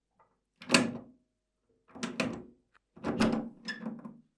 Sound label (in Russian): zvuk otkryvanija dveri